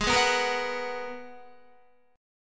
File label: Gsharp Minor 9th, Guitar; strummed; 15,11,11,15,11,14; 4F,4A,5C,5G,5C,6E